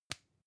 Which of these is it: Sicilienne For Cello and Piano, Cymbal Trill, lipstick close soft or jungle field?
lipstick close soft